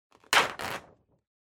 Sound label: Calendar Tear